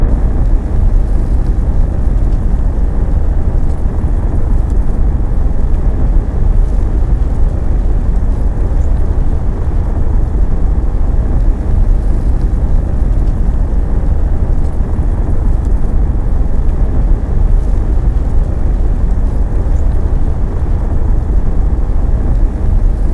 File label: Ambience Station Thruster Loop